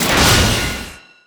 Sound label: Rocket Launcher Shot; Weapons